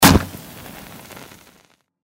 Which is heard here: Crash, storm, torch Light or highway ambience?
torch Light